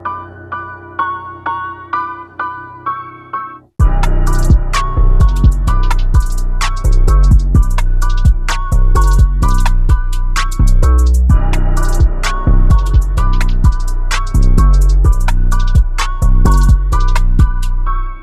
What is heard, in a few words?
TRAP SOUL; SUPREME